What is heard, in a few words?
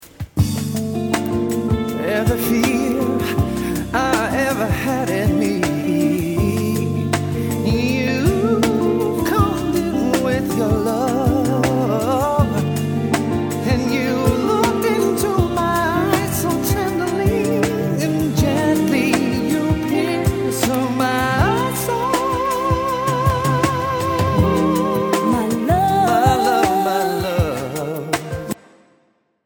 Duet